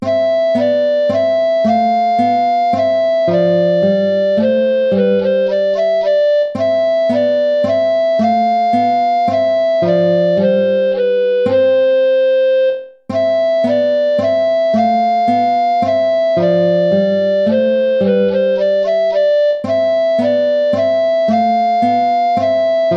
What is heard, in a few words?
tempo minuetto; hook james